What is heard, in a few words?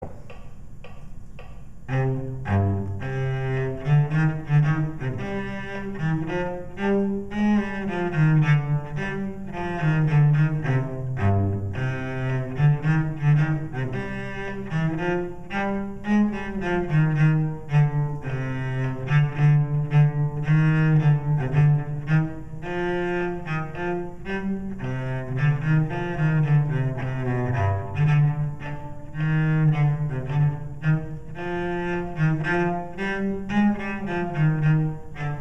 farandole fast cello